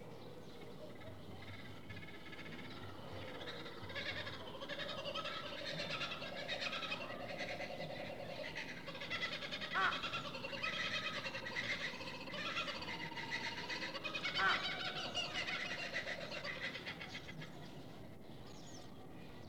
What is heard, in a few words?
laughing kookaburra; 鳴き声